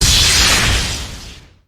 lightning; amara

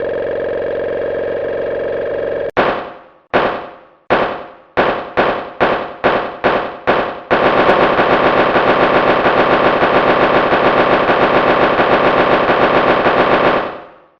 breakdown; cammy

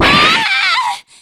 aaaaah; p4u; marie

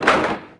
blip; narrator

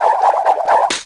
woosh smack

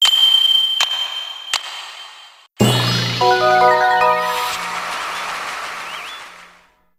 lead up win

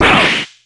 shock; kanji

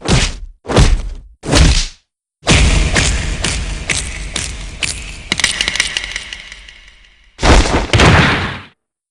breakdown

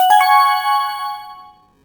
evidence update

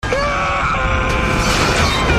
aaaaaaaaagh

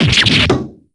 shocked; shiv

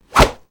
pre point

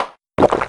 catch; shug

